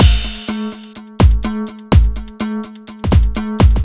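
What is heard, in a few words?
Industrial Waltz No snare, Drum Loops 125bpm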